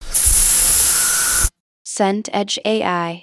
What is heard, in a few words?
breath shaky